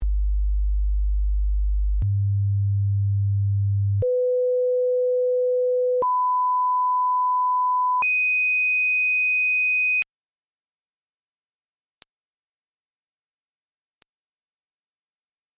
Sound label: HEARING TEST MONO RIGHT, Reference tones; Reference tones that can be used to test hearing and callorbrate equipment